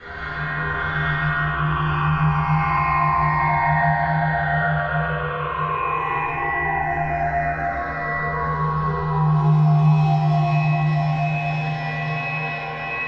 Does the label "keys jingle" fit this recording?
No